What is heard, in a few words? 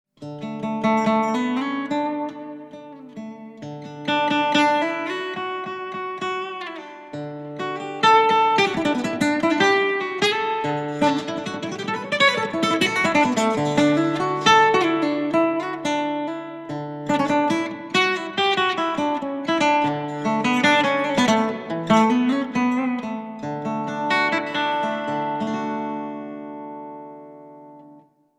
acoustic